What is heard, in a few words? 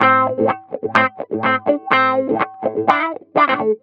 Guitar Wah Loop, 125bpm; Zero-G; Nu Disco